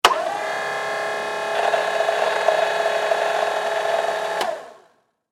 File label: Industry Hi Lo Motor Operate; Motors; Machines; SFX Bible